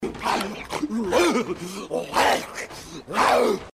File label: growl; grinch